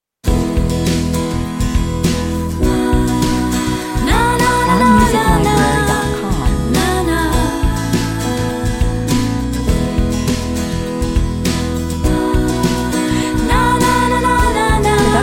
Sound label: jingle, 102 bpm